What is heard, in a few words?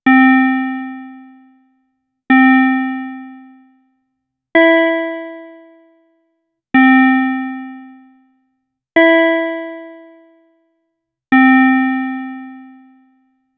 mobile game soundrack